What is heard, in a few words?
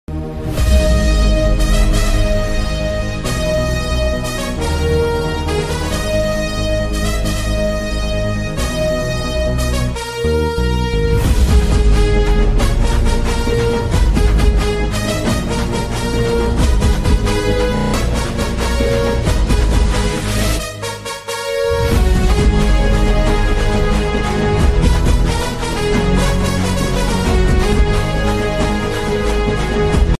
bgm remix; maari bgm ringtone